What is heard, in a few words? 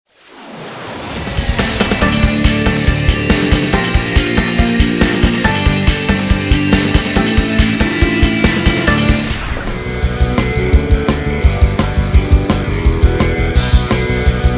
rock